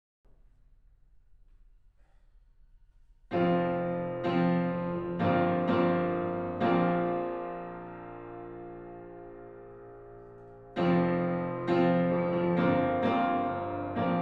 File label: PNO Room